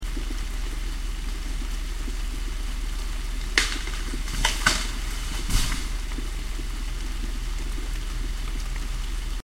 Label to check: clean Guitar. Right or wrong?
Wrong